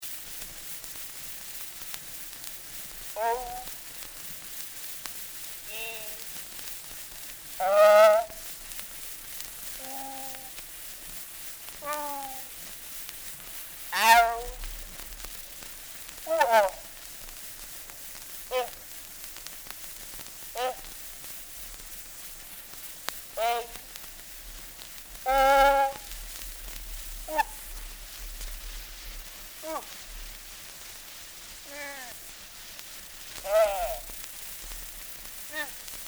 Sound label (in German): Vokalaufnahmen